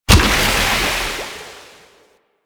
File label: Undertow Corrosive; Warframe; Warframes; Pirate